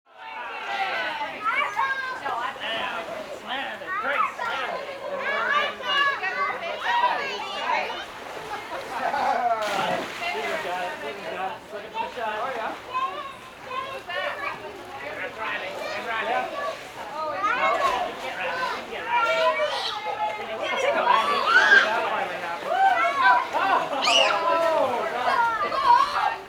Swimming; People

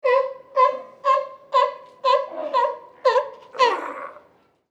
Sea Lion Bark; iMovie '08 Sound Effects

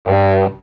Comedy Low Honk; Stingers

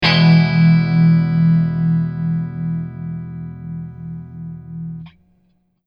Electric Guitar Maj Chord; iMovie '08 Sound Effects

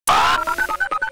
Synth Zingers; Stingers

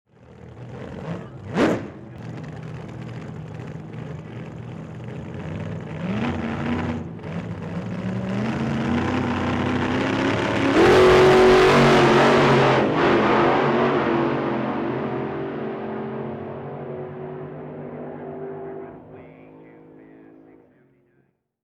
Drag Race, Transportation

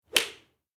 Golf Hit; iMovie '08 Sound Effects

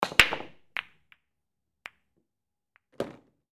Billiards; iMovie '08 Sound Effects

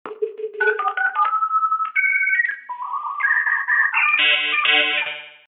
Electro Beep Accent; Stingers

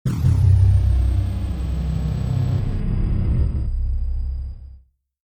Star Drive Engaged, Sci-Fi

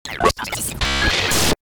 Communication Static, Machines

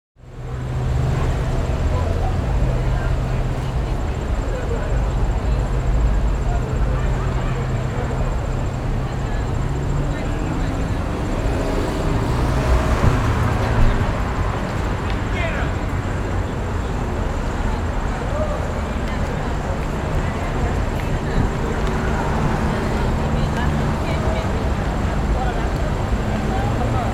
City Night Crowd; iMovie '08 Sound Effects

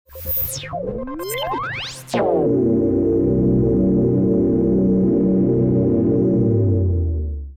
Synth Zap Accent; Stingers

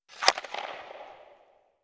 Hockey Shot; iMovie '08 Sound Effects

